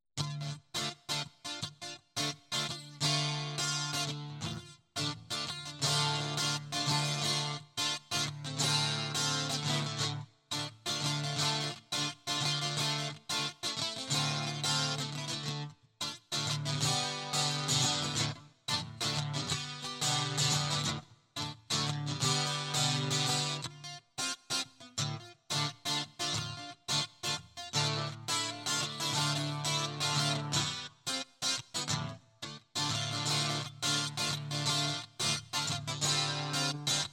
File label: Guitar